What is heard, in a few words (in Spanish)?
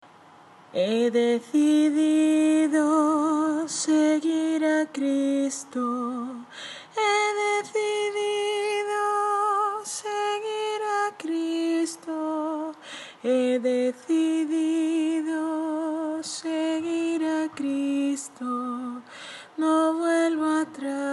He decidido seguir a Cristo segunda Voz